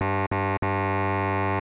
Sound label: Electronics Test Fail; SNES Test Program